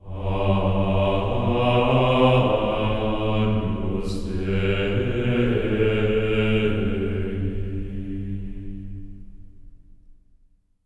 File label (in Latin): agnus G3; Symphony of Voices; Spectrasonics; GR AGNUS